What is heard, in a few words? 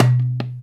NAGARA; InVision Interactive